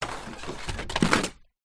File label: cards birthday x5 fall off shelf